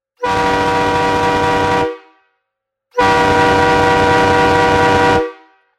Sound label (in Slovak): fanfara 400mm chromova 3 tonova bez kompresora